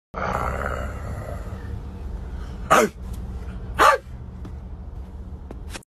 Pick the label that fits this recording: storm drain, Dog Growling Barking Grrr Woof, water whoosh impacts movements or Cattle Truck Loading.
Dog Growling Barking Grrr Woof